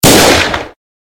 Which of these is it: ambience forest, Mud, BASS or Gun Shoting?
Gun Shoting